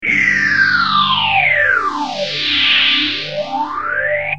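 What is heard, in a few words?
guitar, Morley deluxe phaser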